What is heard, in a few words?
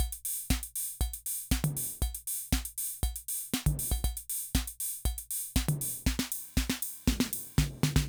Loop, rhythm 55, classic machines